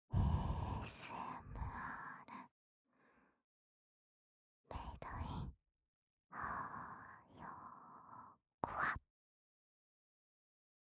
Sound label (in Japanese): ASMR ひのき入浴剤; お土産ASMR綾香編; アノ娘と温泉旅行編